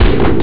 chds, drums snare; OctaMED SoundStudio V1

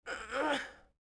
push; poolofradiance

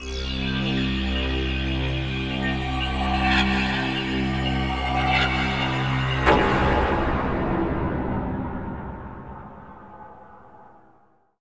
logo tune

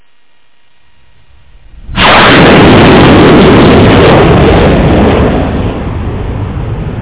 jet

